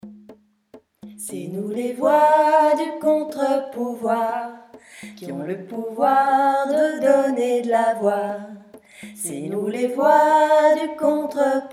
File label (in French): Voix 1 Melodie; Donner de la voix